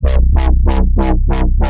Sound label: Synth 140 Bpm Warble